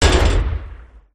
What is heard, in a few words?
pendulum turret fire stop, turrets; gow